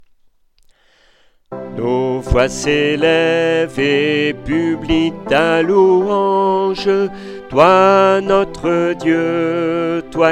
ALTO

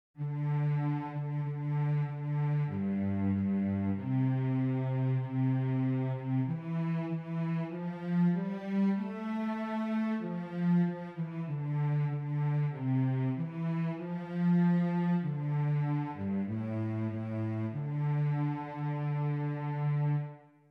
VIOLONCELLE; ANAMNESE St Jean

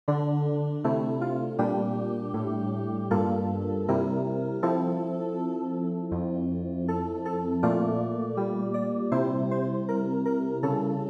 CLAVIER; Psaume 129

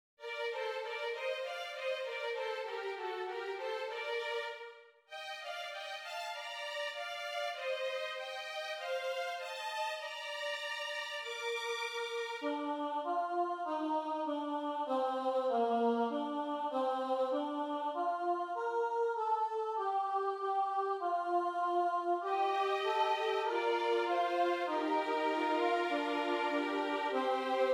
violon; PriereDeFrancois

